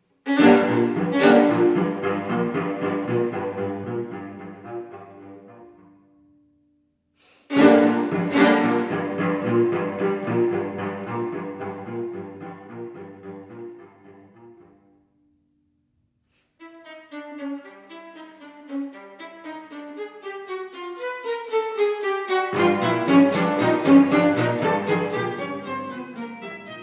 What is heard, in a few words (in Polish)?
Kwartet smyczkowy